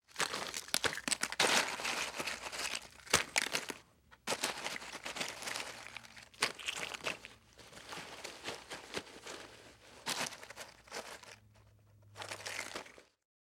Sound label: Household Peanuts Grab And Rummage Through Bag